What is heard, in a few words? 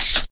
SFX, 180 BPM, Drum & Bass